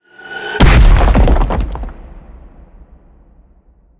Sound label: symbol Explosion; asgardian stones